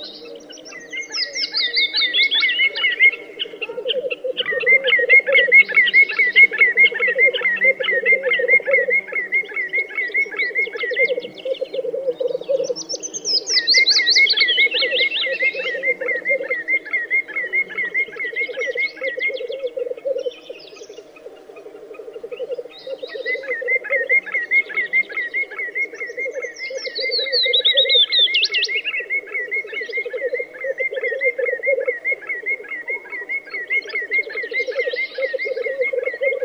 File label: black grouse bird song; soundscapes